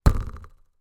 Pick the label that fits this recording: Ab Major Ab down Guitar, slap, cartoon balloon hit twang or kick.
cartoon balloon hit twang